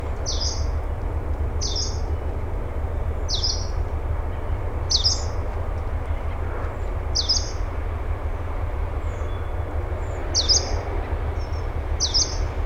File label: Humes Leaf Warbler Phylloscopus humei, Ladakh